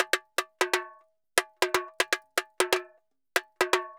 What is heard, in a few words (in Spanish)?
Tamborin Bomba 120, Drums & Percussion; Best Service; Festa Latina VOL-1